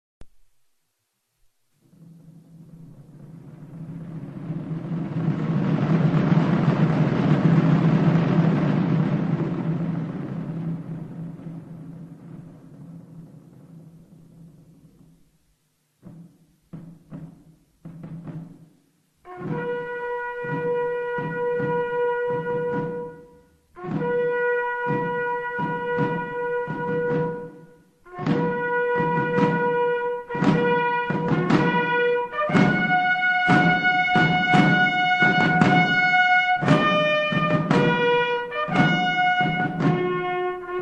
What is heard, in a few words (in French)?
musique militaire sonnerie aux morts francaise